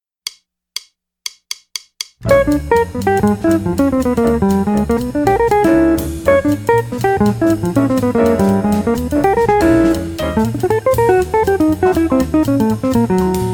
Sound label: Hip Licks Guitar fast version